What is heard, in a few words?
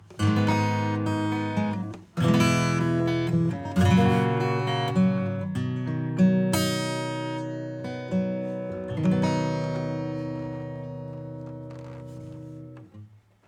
SubBass 808 acoustic; Doctor